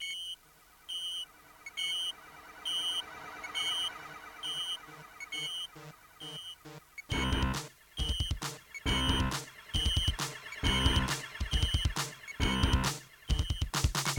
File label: ensoniq opus; Ensoniq Sounscape Opus; soundcard test